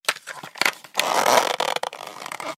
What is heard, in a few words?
Bike Wheel; this is the zodiac speaking 2020 gamerip switch ps4 xbox one pc macos